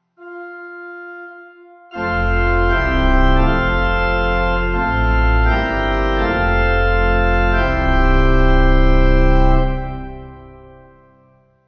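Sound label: pipe organ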